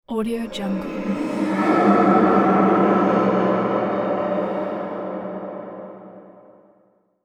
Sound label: hollow space vox; sound-ideas